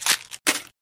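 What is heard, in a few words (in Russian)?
человек выбросил мусор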